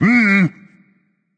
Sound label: tongue berserk; cuchulainn